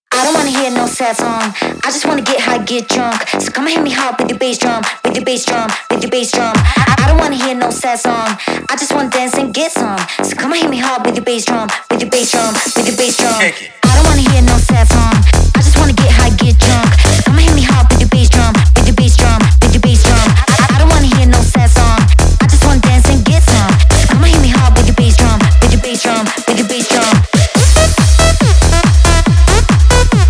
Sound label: bassdrum smack; club